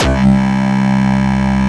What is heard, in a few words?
BS MONDO C, Wave Bass